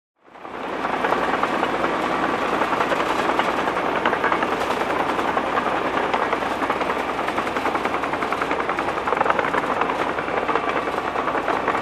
Cement Mixer Rolling

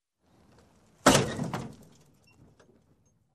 Car Bump Sound

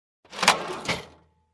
Cash register drawer open

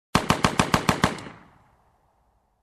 Banging machine gun shots

